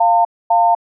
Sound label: waiting tone; Samsung SCH-M490 T Omnia